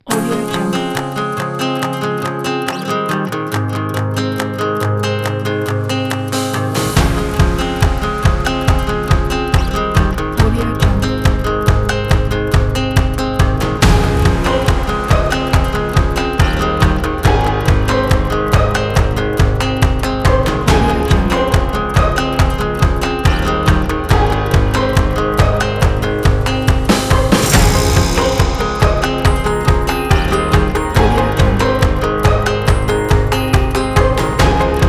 happy music kit

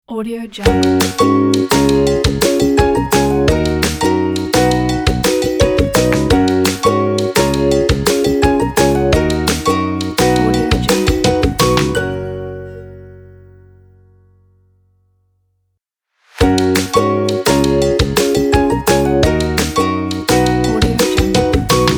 acoustic upbeat happy logo

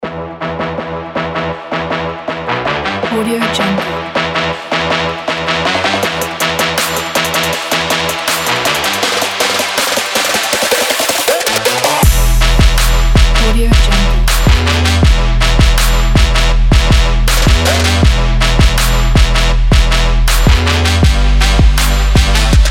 trap

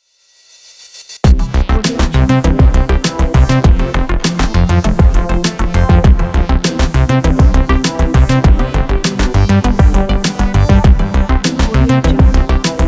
tech loop

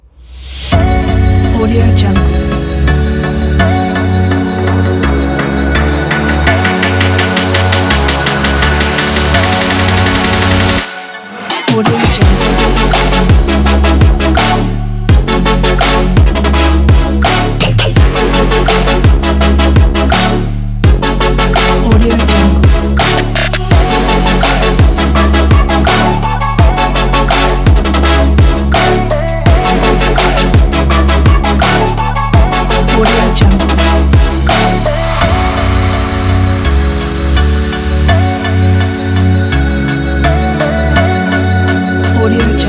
a future bass

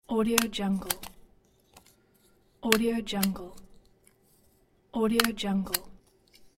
stapler; audioheart

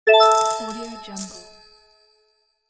game coin

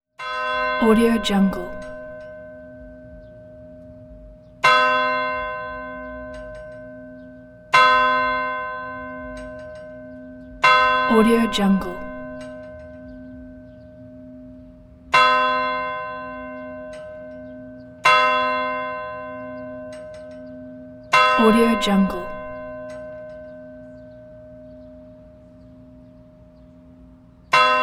church bells

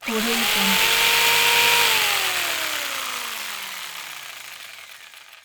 sabre saw operation; stormwaveaudio

